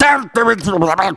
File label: Kill shout; Buffet